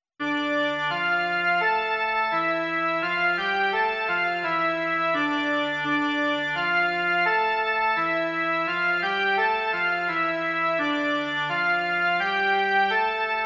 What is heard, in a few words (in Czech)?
jen melodie; Velký Bože, my se Ti klaníme